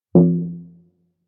muffled, muffled tone; soft subtle ringtones

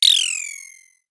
gone chirpin; standard ringtones

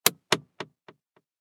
net

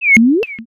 short trip to the bottom, notification sounds

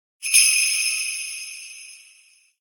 christmas bells, message tones

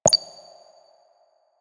hollow

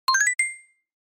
achievement; message tone

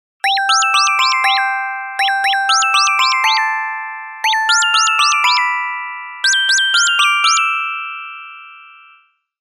playful jingle bells; christmas ringtones

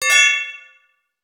metal

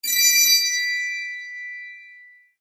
tiny rings

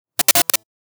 glitch

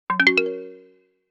dutifully notification tone

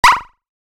that look; notification sounds

